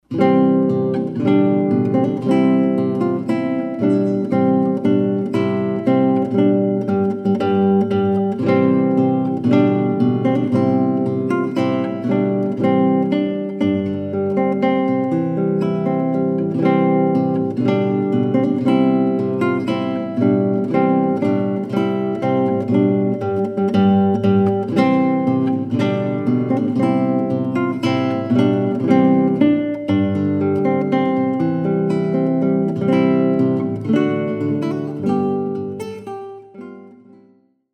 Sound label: trumpet voluntary